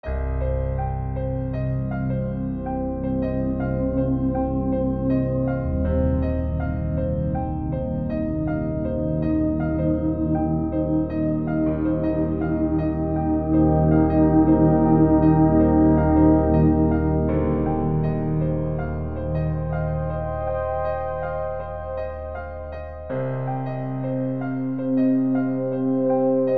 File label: weird vid music; CC0 Scraps